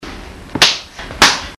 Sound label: slap links rechts